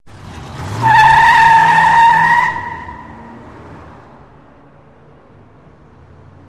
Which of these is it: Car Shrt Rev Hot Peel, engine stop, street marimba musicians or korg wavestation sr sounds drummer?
Car Shrt Rev Hot Peel